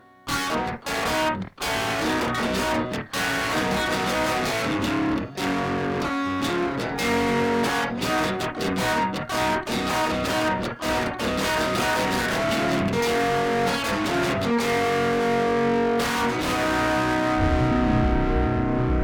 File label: electro rave up